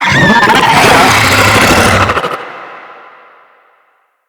Infested Ancient Toxic Call; Enemies

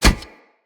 Kubrow Step Metal, Footsteps

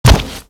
Step Metal, Footsteps; Warframe; Grineer

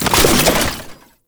Archgun Reload; Warframe; Weapons; Archwing; Tn Nokko Archgun

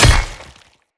Great Sword Impact Flesh, Combat; Weapon Impacts; Player; Swords; Founders Great Sword

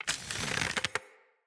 Bow Charge; Weapons; Josalfar Bow

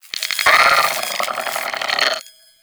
Sentient Brachiolyst Idle; Warframe

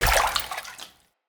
Walk Water, Footsteps; Characters; Enemy; LadyDeora

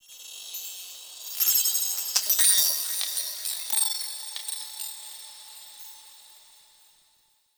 Glass Texture Small, Ambience; Warframe; Cephalon Weave

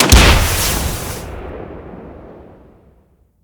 Grenade Launcher Explosion; Weapons; Grineer

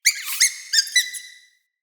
Summon Squeak, Animals, Vocals; Floppet

